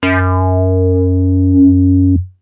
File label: tight square F sharp 4, Tight Square Bass